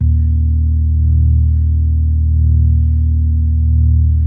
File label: EB1S, B3